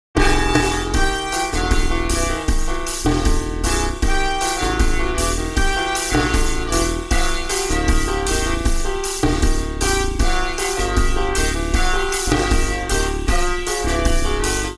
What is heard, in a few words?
Jazz; TSMtoolbox